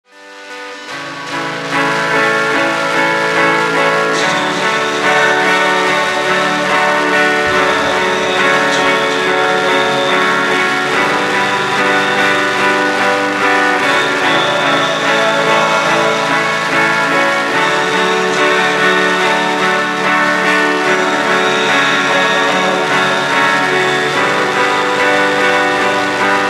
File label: tremolo